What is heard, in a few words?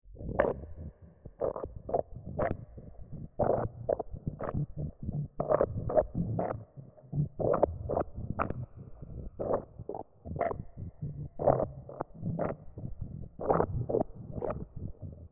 Swamp Creature, Gurgling alien swamp creature